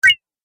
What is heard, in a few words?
Game Jump, Video Game Sound Effects